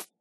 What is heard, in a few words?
hop, rabbit; Minecraft